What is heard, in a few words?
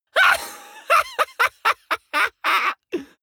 laughter lunatic; vocals; comedy-cartoon; soundpool